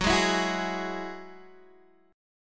F sharp 7th Suspended 2nd F sharp down Guitar DADGAD